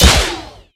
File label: crit hit; video games; source engine; tf